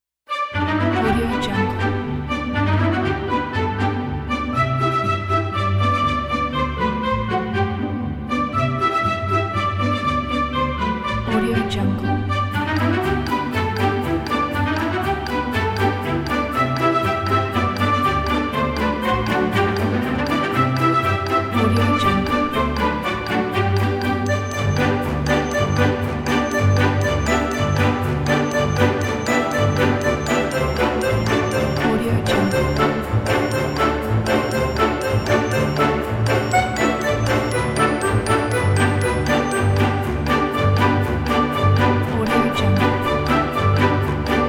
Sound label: fairytale circus